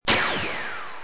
Disparo

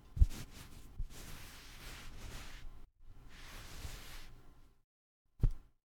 LIMPIAR SUDOR FRENTE; Yesterday Origins; Switch, PS4, PC, iOS, macOS

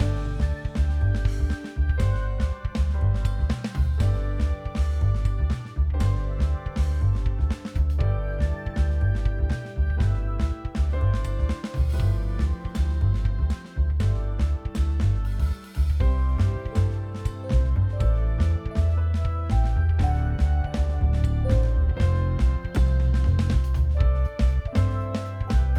music isla Reptiles; Lootlite; Xbox Series X/S

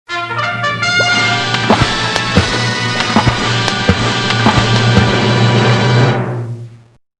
Fanfarria; Abrapalabra La Magia de Aprender a Leer; Fanfarria de Pinturas

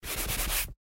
SFX Borrar tag

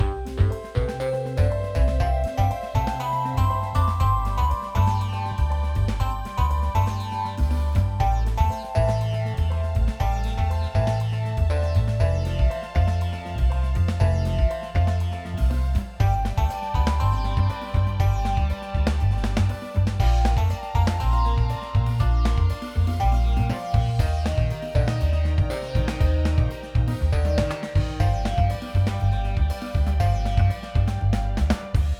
music playa; Lootlite; Xbox Series X/S

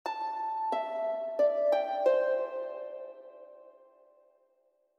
MELODIA SIMPLE

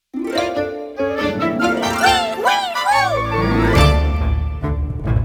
Fanfarria Victoria; magic twins

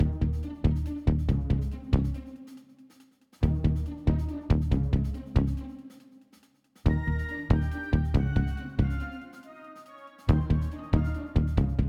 music pradera; Lootlite; Xbox Series X/S

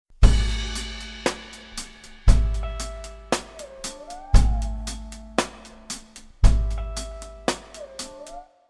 BATERIA SOLA; Wii U gamerip 2015

